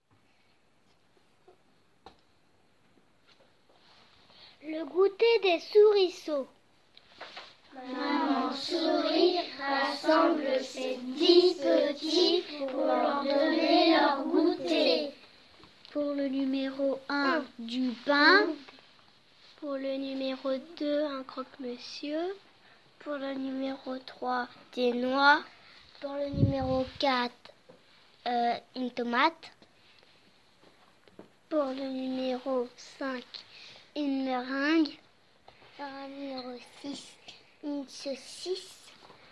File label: Vocal